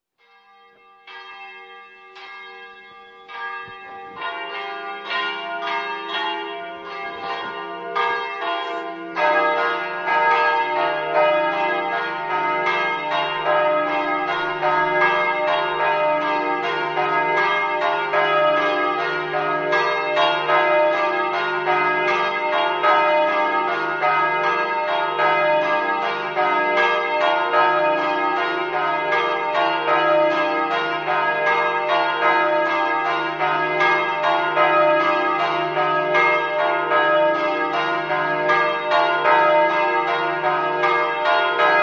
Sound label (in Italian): smartno, campane